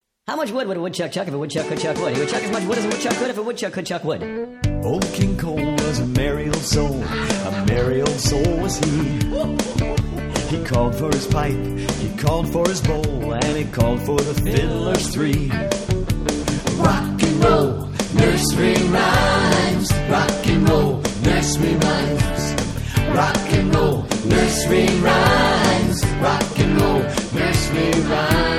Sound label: rock n' roll nursery rhymes